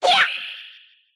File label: ice kunai; Mystical Ninja Starring Goemon; sasuke